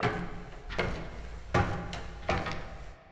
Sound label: Move; ycrpg